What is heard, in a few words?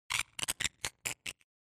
Horror Accent Effect Short